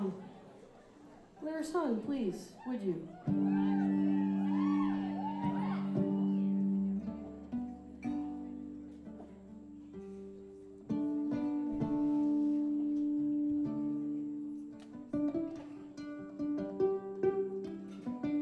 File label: violin intro